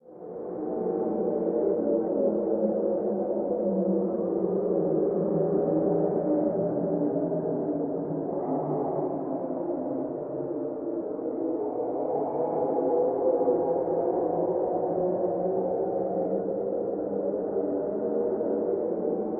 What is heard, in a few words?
Small ambient fog; STALZONE